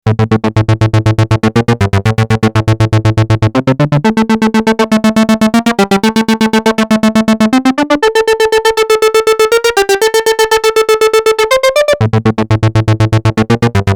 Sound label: synth